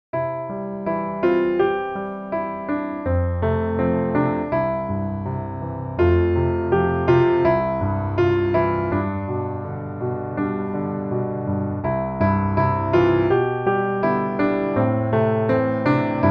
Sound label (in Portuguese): piano